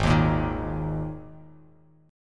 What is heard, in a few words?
G sharp Suspended 2nd, G sharp, down, Bass Standard; G#sus2; strummed; JGuitar